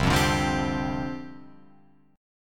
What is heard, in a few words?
C Suspended 2nd Suspended 4th Guitar Open F, Csus2sus4 chord; Csus2sus4 strummed